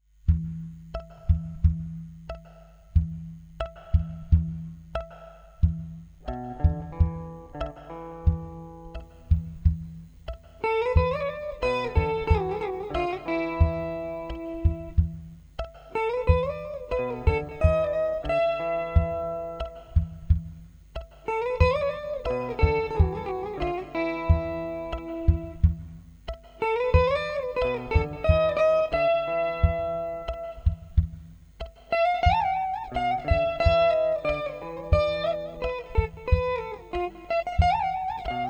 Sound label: Symphony in Raga Rupavathi